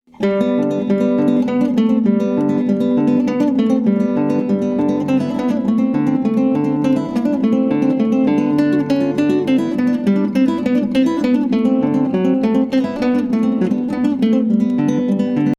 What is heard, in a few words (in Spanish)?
murmullo musical